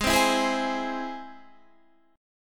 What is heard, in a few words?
Ab Augmented Ab Guitar Standard; Ab+ strummed; JGuitar